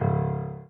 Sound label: C#2 Db2, Piano